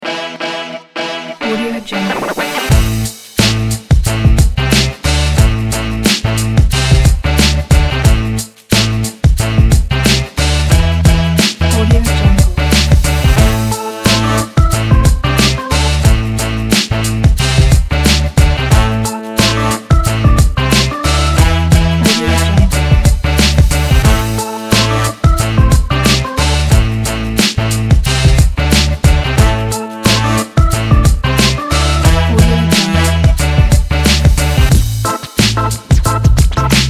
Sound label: funky